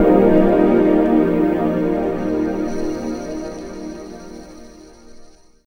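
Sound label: Hot Bathtard C minor, Pads; Total Drum&Bass; 90 s Sample CDs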